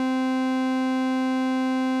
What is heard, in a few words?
TB-303 SQUARE